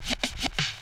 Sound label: Reverse Snare